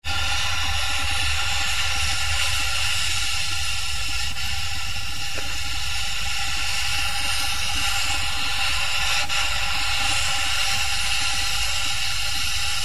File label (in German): rauschen